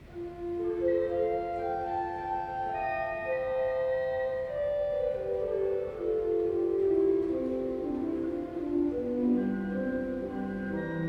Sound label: salicional 8, kirchenmusik pfeifen; gereon koeln